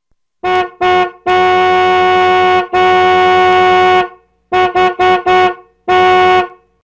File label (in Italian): Effetto Sonoro Clacson Auto; riconosci l'oggetto dal suono